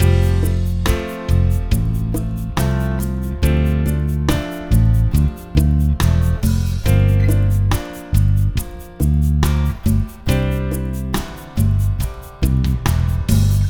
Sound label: Using Barre Chords Exercise Loop